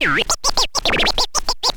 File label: scratch short, Vinyl scratches